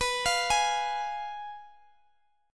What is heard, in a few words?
F Diminished B